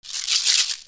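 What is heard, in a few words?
percussion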